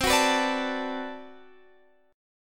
B-Minor 7th C

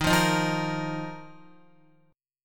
Eb Suspended 2nd, Guitar; Ebsus2 strummed; JGuitar

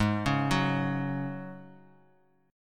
G sharp 7th Suspended 4th, Bass Standard; arpeggiated; chord; x,11,11,11

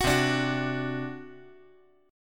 C Suspended 2nd Flat 5th C down

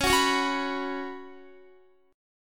Db 7th Suspended 4th Db down Guitar; strummed

